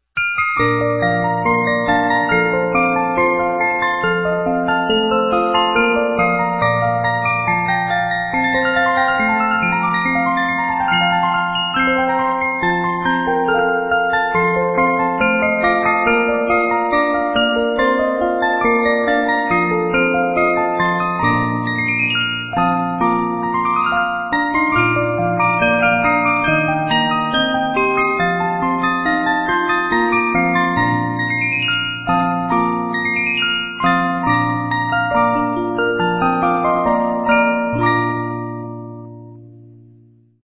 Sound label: THE MAGIC FLUTE (VOGELFANGER), 72 Note